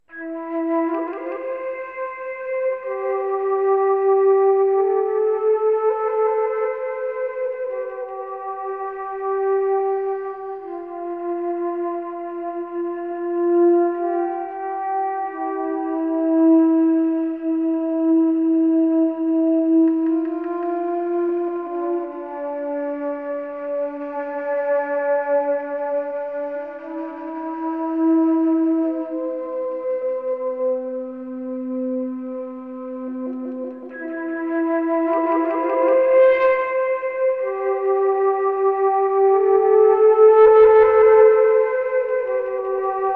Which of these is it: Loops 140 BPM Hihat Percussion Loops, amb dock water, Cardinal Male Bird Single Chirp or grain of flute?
grain of flute